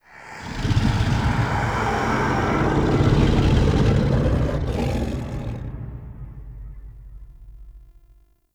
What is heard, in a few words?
Idle Agile Bow; Voruna